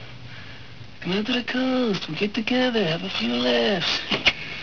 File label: few laugh